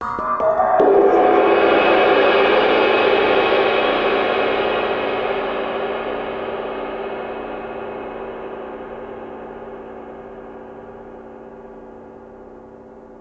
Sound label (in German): Becken Rauschen